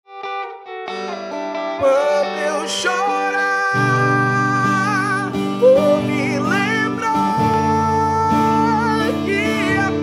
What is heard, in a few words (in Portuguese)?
cover voz e violao